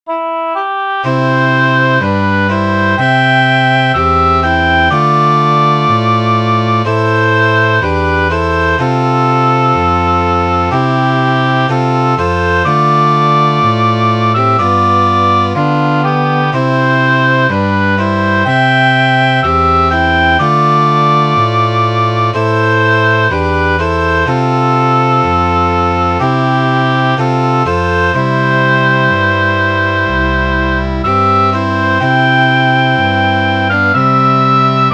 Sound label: Melody in C Major for Oboe Strings